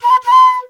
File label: flute bumps